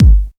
rich lows steel kick drum sound g sharp key, bass drum single hit